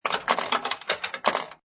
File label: Pinball Sound Ball Being Knocked Between Several Objects; Video Game Sound Effects